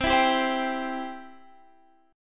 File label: C Major, C, chord